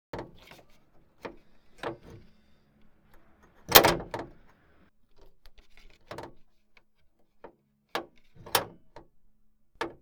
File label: Car Boot And Bonnet